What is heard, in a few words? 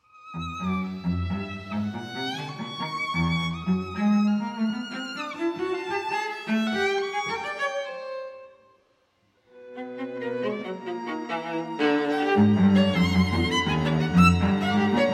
string quartet rubato assai